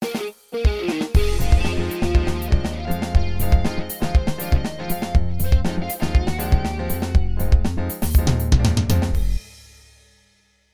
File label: Jingle